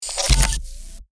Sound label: Short Circuit Crackle